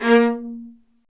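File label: Marcato, Strings; Vlas Marcato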